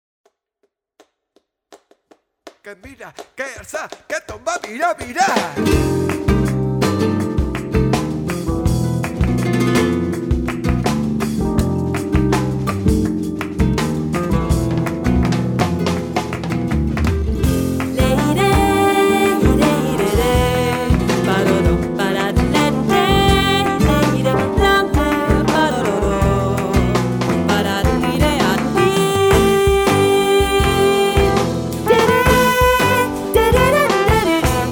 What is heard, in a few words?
Tango Jazz